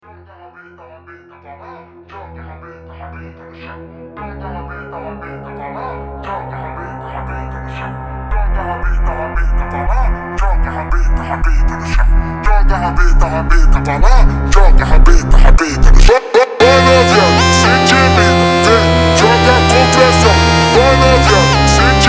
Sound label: FUNK (SLOWED)